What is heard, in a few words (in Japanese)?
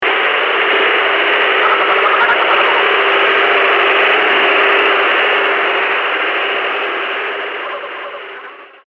bakuha